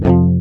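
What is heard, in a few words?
GUI F, acoustic guitar; Best Service Gigapack 2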